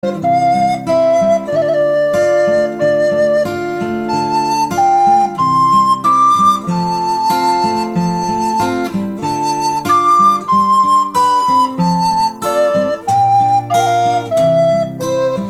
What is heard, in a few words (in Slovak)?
flauta